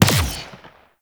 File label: Multi Gun Fire; Enemies; Abilities; Warframe; Corpus